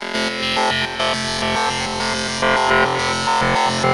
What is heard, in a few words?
Rez Loop, Synths Arpeggios; Electronic Point Blank